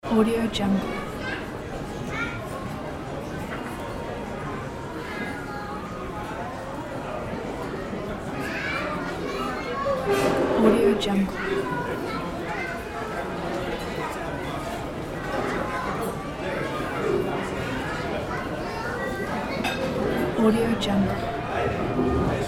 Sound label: restaurant ambience